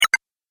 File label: Game Pause, video game